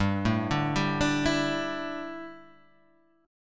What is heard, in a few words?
D Suspended 2nd Suspended 4th G